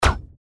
reelspin click; XElements

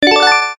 WIN; Joker Card

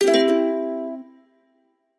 multiline win; Hot Party Deluxe; Wazdan

